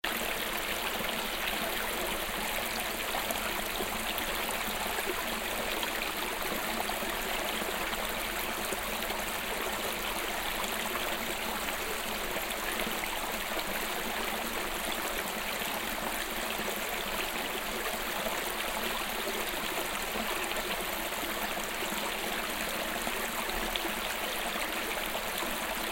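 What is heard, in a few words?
river mouth water flows in the river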